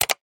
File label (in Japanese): マウスクリック